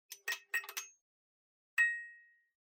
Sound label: Fluorescent Light Noise Crackle